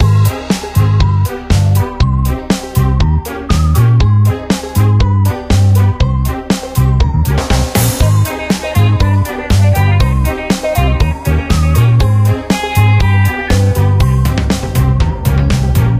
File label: Title Screen, music; FoodTruck